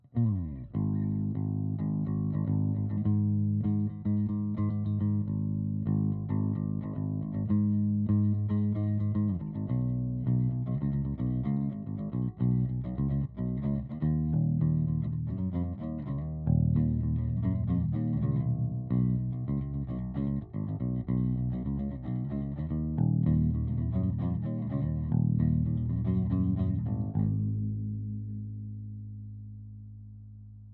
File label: Bass SM57 - Test; 97 Percent Fire Studio